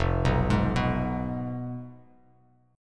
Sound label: F Diminished F down Bass Standard; Fdim arpeggiated; JGuitar